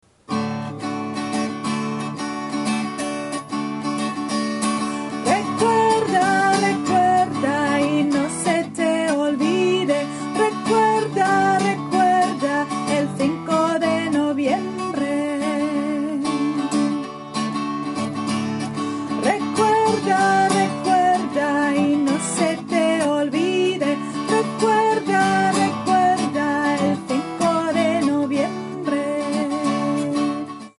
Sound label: Cancion chorus; Guy Fawkes